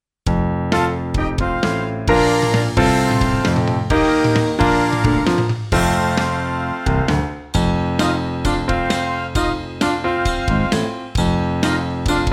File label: Catfish Blues